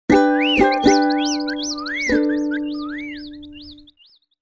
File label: guitar